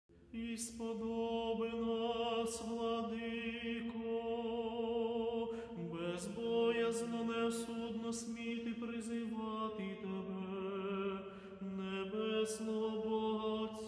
Otche nash from his Orthodox Liturgy Kyiv Chamber Choir; Stetsenko Kyrylo